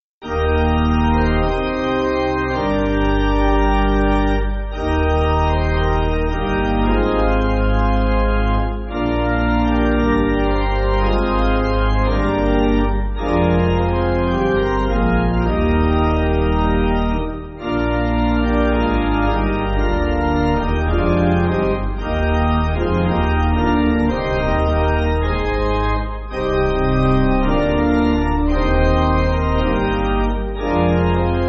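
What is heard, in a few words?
Pipe Organ, church backing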